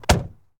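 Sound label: Truck Cherokee Door Close; SFX Kit; Trucks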